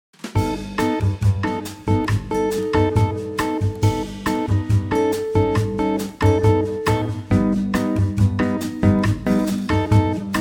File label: Db bossa no solo